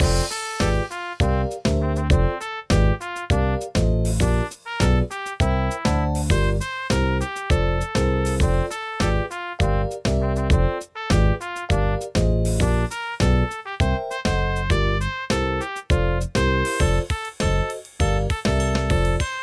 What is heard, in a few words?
midi